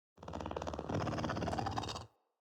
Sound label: Pet Purr; Catbrow; Pet Ship